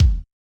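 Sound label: Bass Drum Rock; Yamaha DJX-2B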